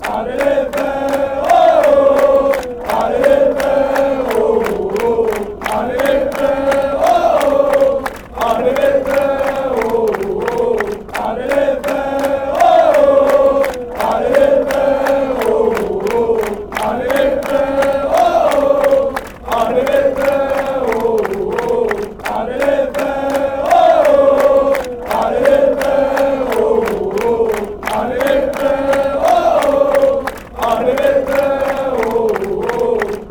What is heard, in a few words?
come greens woah, chants; Association Sportive de Saint-Étienne Loire